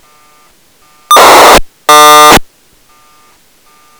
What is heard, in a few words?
beacon; ballon